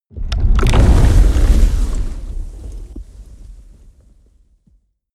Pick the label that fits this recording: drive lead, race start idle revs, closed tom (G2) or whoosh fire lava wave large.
whoosh fire lava wave large